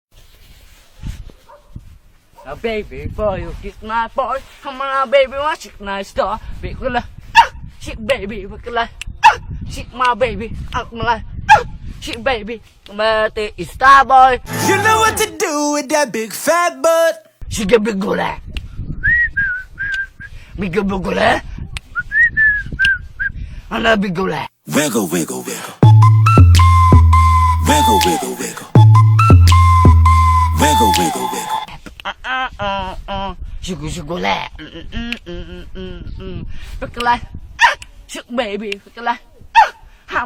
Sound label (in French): gitan qui chante